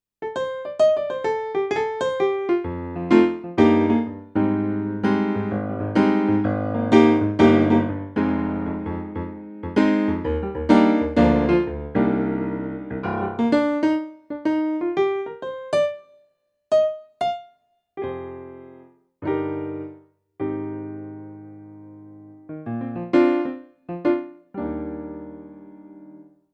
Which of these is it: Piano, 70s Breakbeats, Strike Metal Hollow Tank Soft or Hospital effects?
Piano